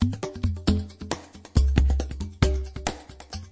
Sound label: 136 ethno benders, drum loops and breaks